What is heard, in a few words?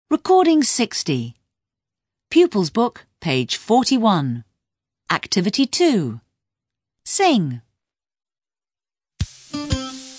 food song; summercamps